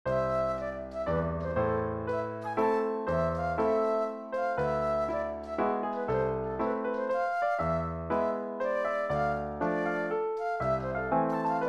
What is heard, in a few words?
Mazurka; Gennetines 2008